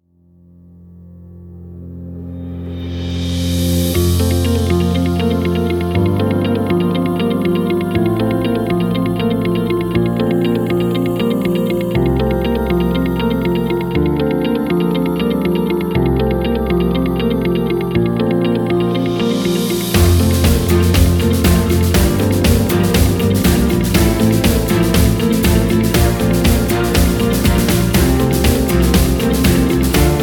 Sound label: Corporate Uplifting